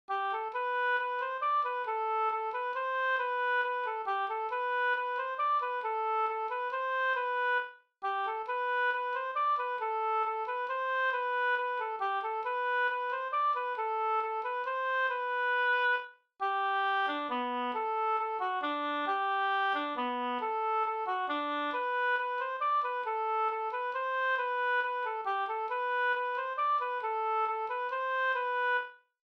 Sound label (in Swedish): langdans; Nigdansen Ahlstrom